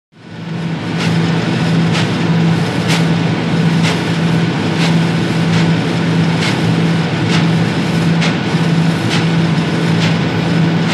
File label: Corn Drier Silo; conveyor belt